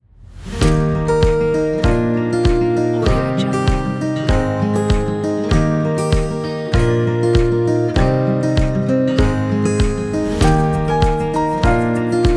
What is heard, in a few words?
acoustic guitar inspiring music